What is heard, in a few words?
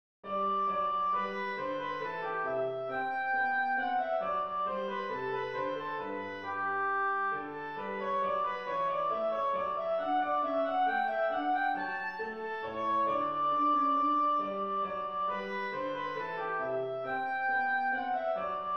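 bourrée, G major; sol majeur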